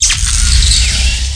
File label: Damage Amp; axisdemo